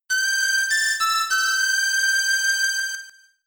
Whistle; Grass Whistle

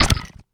Meat Chomp

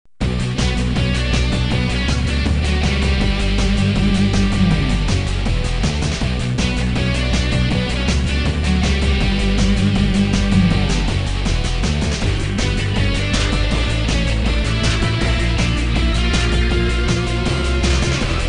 TV Theme - Instrumental

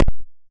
Jump Block; android; mobile; gamerip; syobon action halloween edition

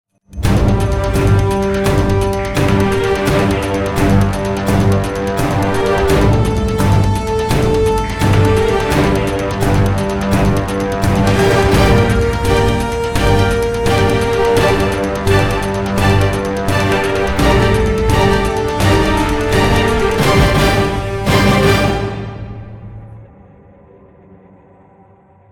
Action Signal

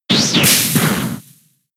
Vine Whip; pokemon sfx gen 6 attack moves xy oras

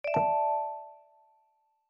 Bomb Awake; my cute commissar 2020 gamerip

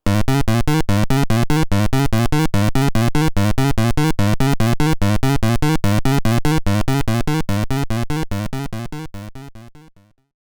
Spurt Walking Sound; BeroBero

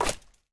Net Break; doodle jump 2; android

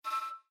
Feed Discovered; Festival

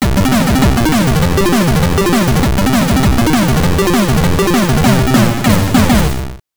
Super Turbo Boost

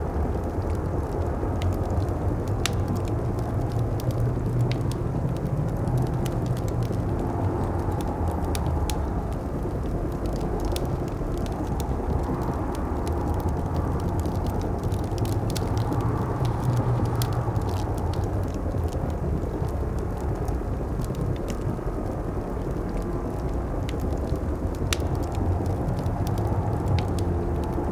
Guild Shop Ambience

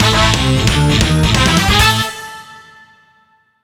Battle Won; Spectrobes: Origins; Wii

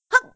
Jump; Fireboy and Watergirl

